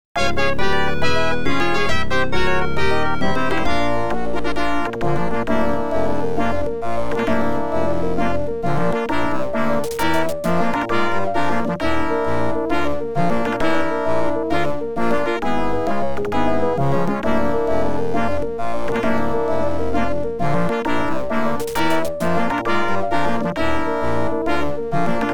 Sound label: OPL2; X WING